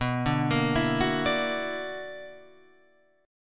B-Minor Major 11th, Guitar Open F; BmM11; arpeggiated; 11,9,10,11,9,9; JGuitar

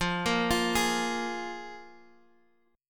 F Minor Double Flat 5th Guitar Open G; 15,15,15,13,x,x; down; chord shape

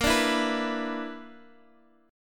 B Minor Major 7th Double Flat 5th A sharp, chord

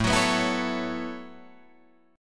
A 6th Add 9th, Guitar, Drop D

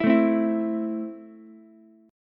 E Suspended 4th Sharp 5th E down Ukulele; Esus4#5 strummed

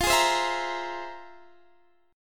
F Minor Major 11th, F; FmM11; strummed; x,20,18,17,17,18

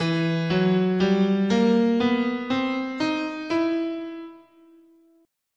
E Hungarian Gypsy, guitar, scale

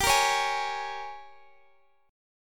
G sharp Major 7th Suspended 2nd Suspended 4th

